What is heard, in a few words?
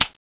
shoot empty; weapons; furien; cstrike; thanatos1